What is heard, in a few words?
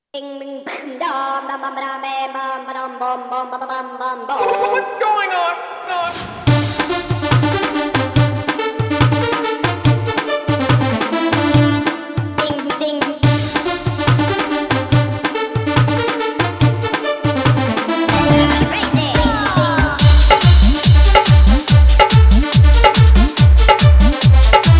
Funny Song